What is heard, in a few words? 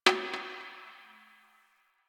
Garbage Metal Can; Warframe; Ambience; Nineteen Ninety Nine; DE